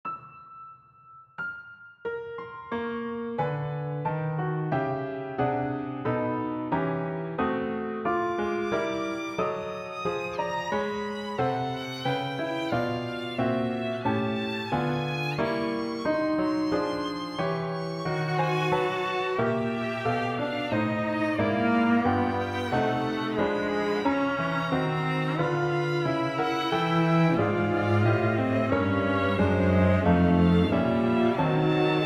Double Dodecaphonic Canon for String Quartet and Keyboard